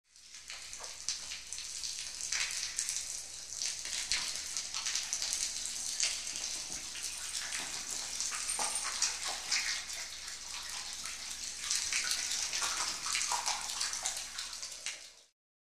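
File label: Distant Water Dripping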